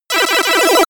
synthetic shooting

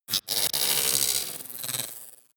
glitch buzz, zaps buzzes

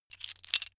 metal pieces clatter short

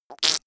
fake fart; koerpergeraeusche

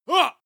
male attack shout short; schreie; menschen

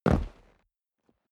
step boots concrete jump; schritte

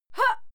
female fight scream short

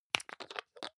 hygiene small screw cup open medium; haus-garten; badezimmer

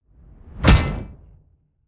sci-fi projectile penetrate metal hard; schusswaffen

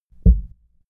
heart beat single hard; comedy-cartoon; koerpergeraeusche

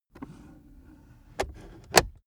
car accessories center console drawer close; autos; verkehr transport

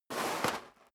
step sneaker gravel scuff

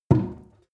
sink pull plug no water; industrie-wirtschaft; gastronomie

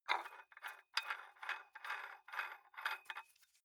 dumbbell open nut thread, fitnessstudio

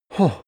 male sigh impressed; menschen; koerpergeraeusche

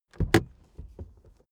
suv open door hard quick

